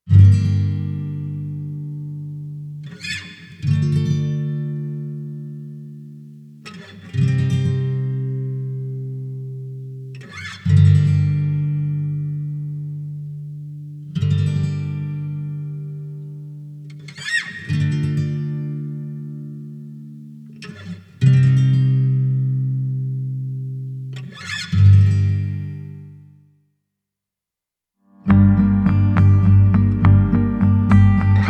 instrumental

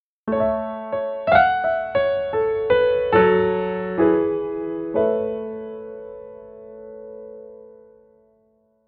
piano

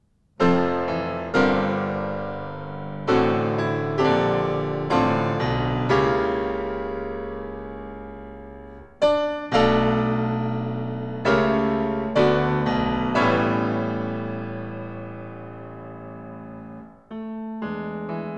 pour piano a quatre mains choral

